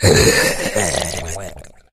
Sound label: fracture die; Soundscape Overhaul; monsters